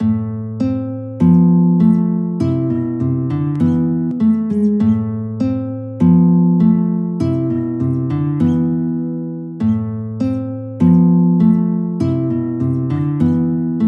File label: Passepied, guitar